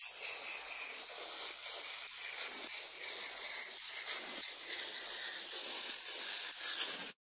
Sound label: Pour Frosting; papas cupcakeria online windows gamerip 2013